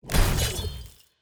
Orbital Dagger AoE Hit; TANK; 3Souls

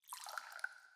spill

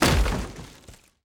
environment object chest breaking

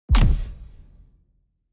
heart damage kick

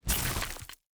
gold block destruction stone

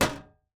object drop metal

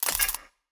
forge weapon buy; Shelter

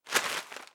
ambience room shadow room working layer

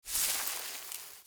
zone bush step

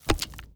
click card open

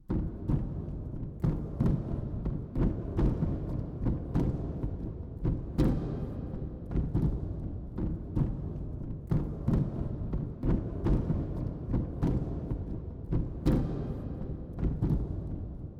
ambience room stomping arena

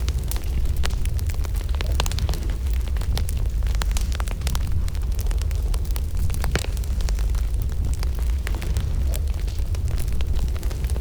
Box Fire Loop; TANK; 3Souls

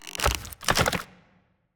tree golem little strike; Shelter

